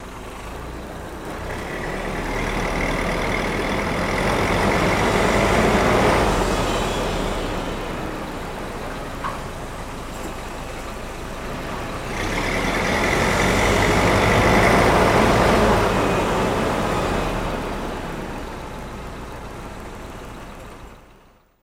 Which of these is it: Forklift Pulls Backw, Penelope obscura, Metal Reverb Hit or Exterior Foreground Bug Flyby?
Forklift Pulls Backw